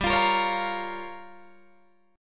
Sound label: G Minor 7th Flat 5th, Guitar Drop D